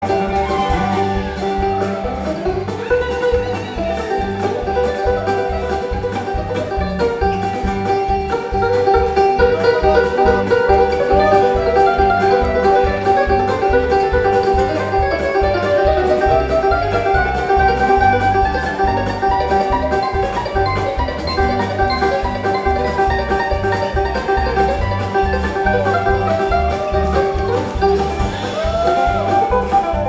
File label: Triple Banjo